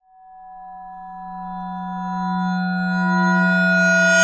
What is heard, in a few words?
reverse clang